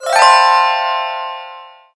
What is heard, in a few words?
good present